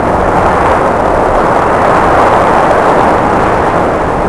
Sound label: windy; WhiteOut; XBOX; bokujo